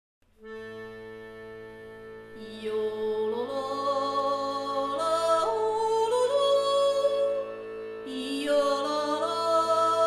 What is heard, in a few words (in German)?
jodler